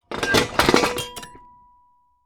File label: Metal; TOOLKIT vol.2; DET24 ElementalMysteries